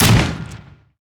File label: heroes basic Attack; anya